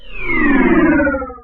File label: machine power off